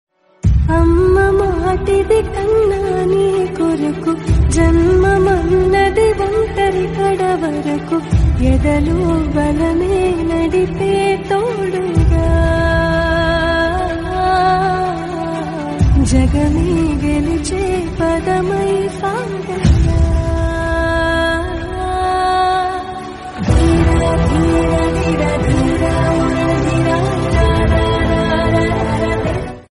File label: Female Version Ringtone; KGF